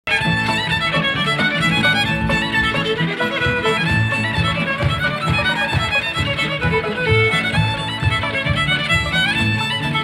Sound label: Barn Dance Tune; 2015 AZ Barn Dance Spring